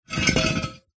foley metal heavy weight drag scrape solid hard ground